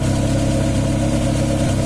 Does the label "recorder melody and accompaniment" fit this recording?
No